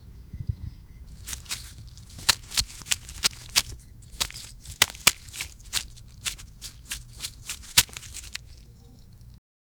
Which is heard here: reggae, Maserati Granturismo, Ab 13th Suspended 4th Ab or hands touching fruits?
hands touching fruits